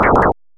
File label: mechanism